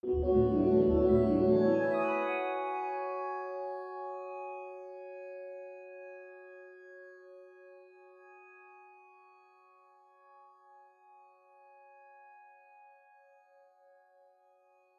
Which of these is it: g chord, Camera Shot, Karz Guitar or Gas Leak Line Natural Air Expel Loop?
g chord